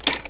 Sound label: keystroke